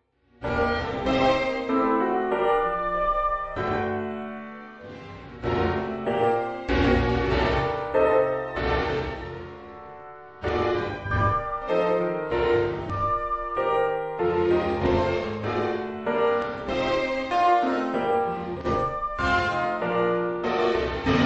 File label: chorale xfade